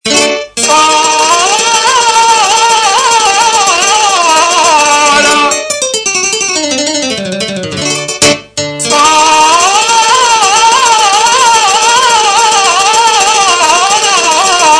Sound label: flamenco